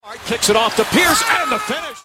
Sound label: Is Calling Ska, ringtones